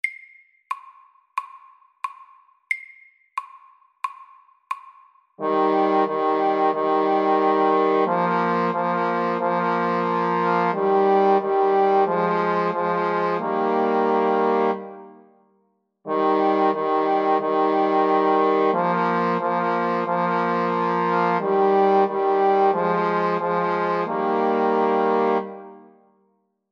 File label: First fanfare SLOW 90